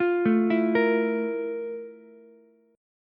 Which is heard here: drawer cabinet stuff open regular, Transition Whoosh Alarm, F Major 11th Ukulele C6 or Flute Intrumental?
F Major 11th Ukulele C6